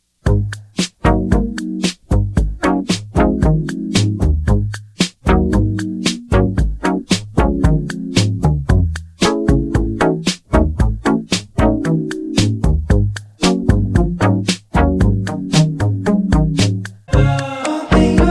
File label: BLUES